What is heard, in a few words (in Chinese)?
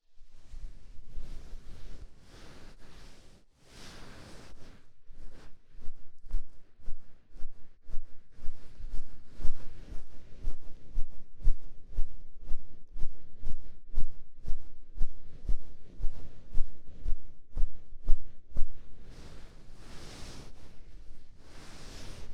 ASMR合集